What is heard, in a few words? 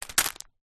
food biscuits packet catch